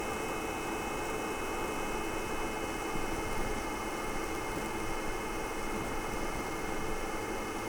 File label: cisco vent orig